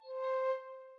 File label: Flute